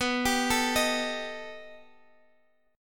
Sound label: B Augmented 7th B down Guitar Open G